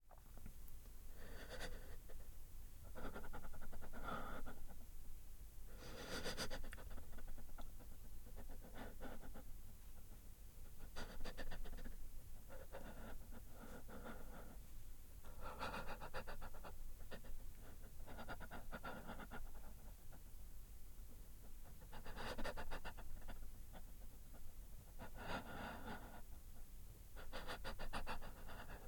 Breathe Male Fast Shuddering; ORTF MKH8040; Pole Position; The Gut-Wrenching Gore Library

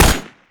npc smg fire, weapons; hl2

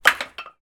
Drops, Wood; InMotionAudio

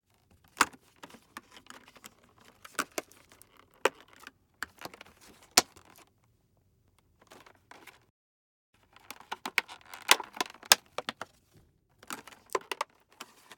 Packaging & Wrappers; TheWorkRoom Audio Post

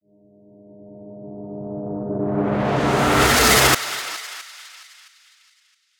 Whoosh; MatiasMacSD; MENTAL STRAIN

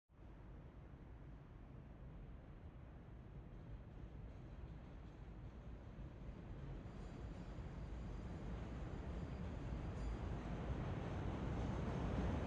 L TRAIN by moderate speed under tracks persp; Griffin Sound Design; Chicago Rapid Transit - The L

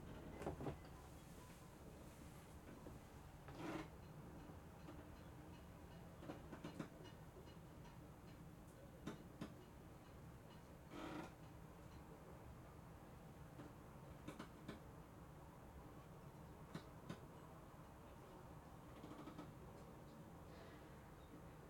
Atmos, INT Small yacht cabin, hatch open; BOATInt; Rogue Waves; Yacht & Marina

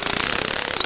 battle talk; hl2; combot

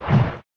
whoosh, strider; hl2

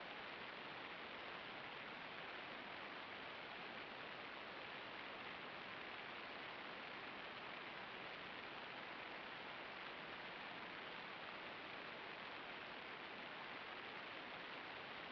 DESIGNED CONSTANT BACKING NOISE, Rain; PMSFX; Rain Designer

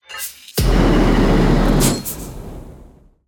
Steampunk Weapons - Firestorm Flamer

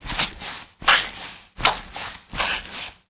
mud loop, Footstep; RYK-Sounds

